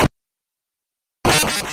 soul shatter; undertale

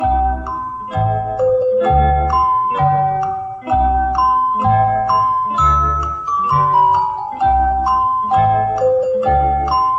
timer funny

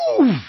oof; mario

